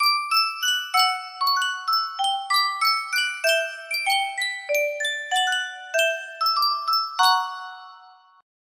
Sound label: Sankyo miniature music box